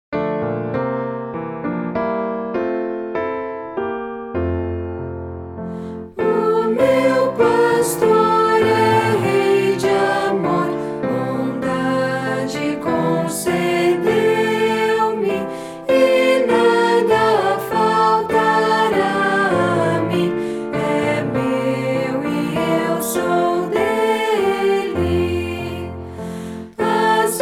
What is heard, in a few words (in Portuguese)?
voz piano